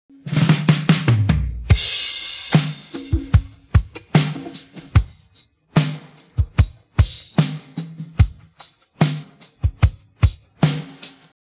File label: drums pattern